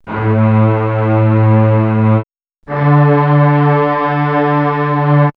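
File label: string, orchestral strings; X-Static Goldmine; backtothe70's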